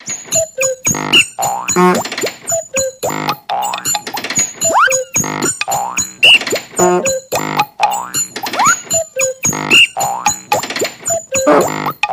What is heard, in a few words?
Comic Machine Fun, machines